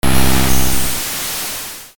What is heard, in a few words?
glass break; PPdastr Studio